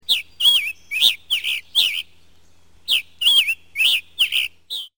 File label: scarlet tanager